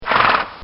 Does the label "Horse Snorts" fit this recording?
Yes